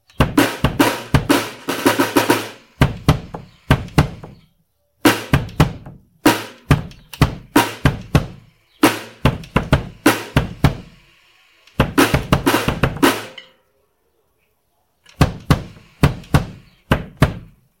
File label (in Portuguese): bateria